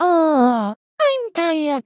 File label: Tired; Neru; SM64 CO-OP; Triple Baka Pack